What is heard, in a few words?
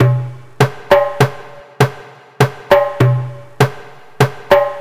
Masmoudi Tabla, Acoustic Beats; Sonic Foundry (Sony Creative Software); Myths of Technology